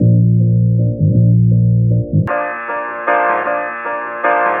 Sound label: bass, Loops